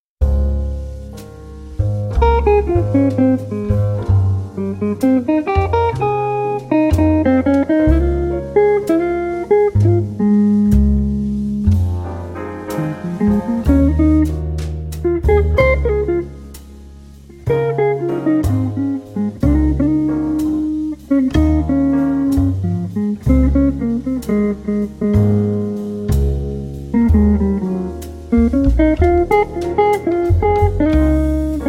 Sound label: Jazz